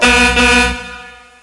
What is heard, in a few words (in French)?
fete foraine tutut